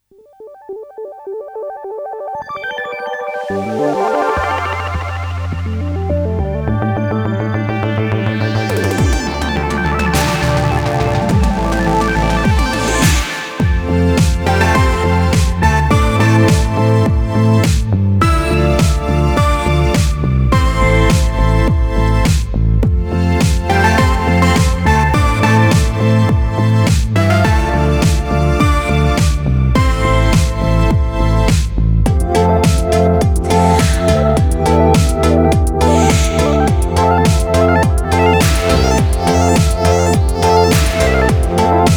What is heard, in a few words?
Music Carstage; sayonara wild hearts 2019 gamerip